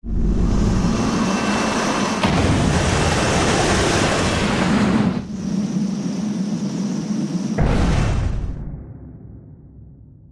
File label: Enter Rail; Playworld Superheroes